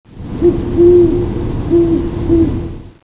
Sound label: owl